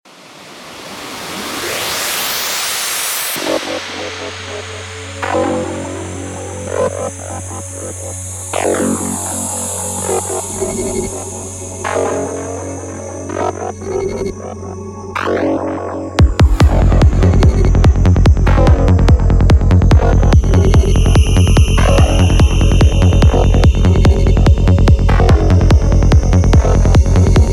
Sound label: fullon psychedelic trance